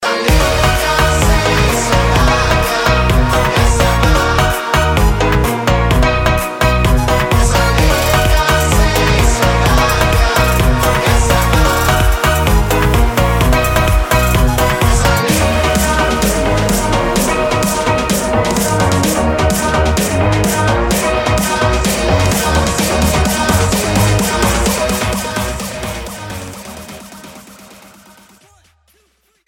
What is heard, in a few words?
samba